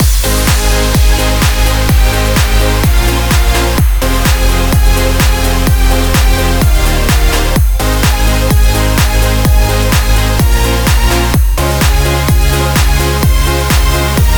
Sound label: positive inspiring dance loop